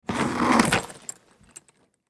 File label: Luggage Bag Lift Off Cement; Pick Ups; Foley